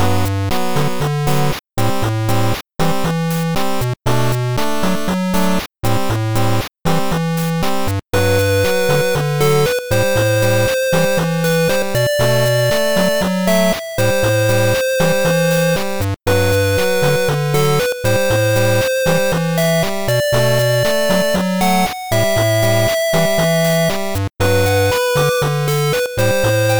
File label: 8bit